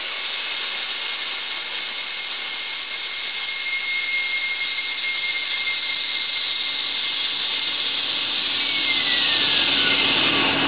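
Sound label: emergency brake; Aln501v2; train; OpenBVE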